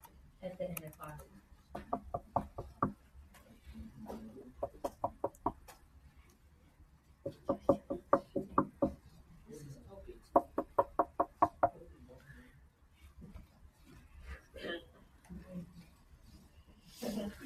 knocking

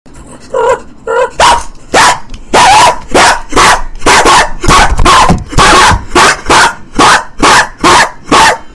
barks loud

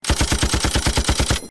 machine gun

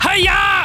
melee fox

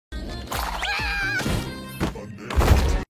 banana slip up

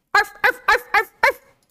arf arf arf arf

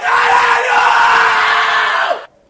notification

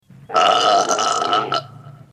ogre burp

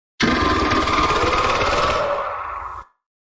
growl anger

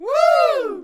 whooo sound

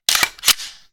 ar15 charge

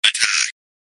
attack; phasmophobia

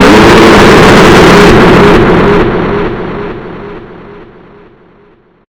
jumpscare sound; golden toonybot

